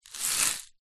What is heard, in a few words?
salt rock in plastic shaker tip movement; zapsplat